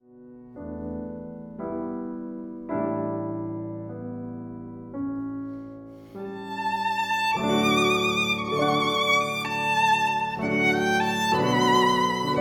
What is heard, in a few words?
VIOLIN